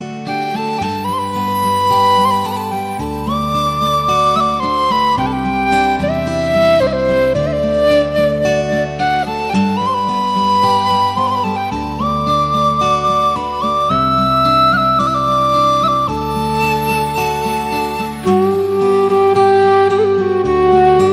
beautiful flute song

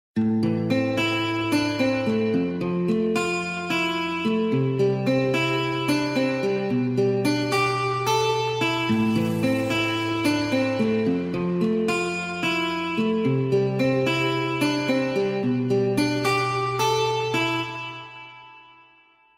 Guitar Ringtone

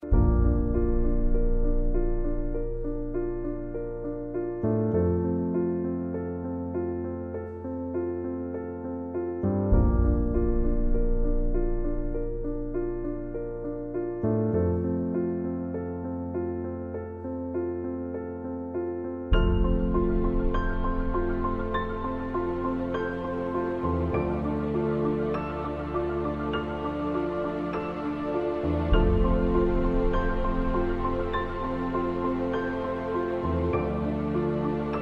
lovely alarm

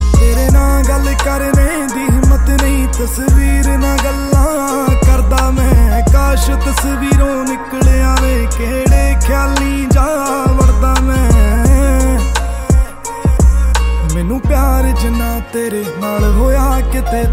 Song Ringtone

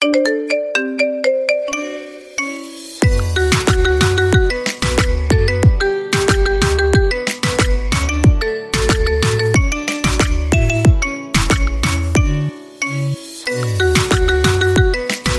Marimba Ringtone

